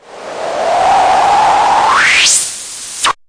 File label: noise MiniBrute, Noise Sweeps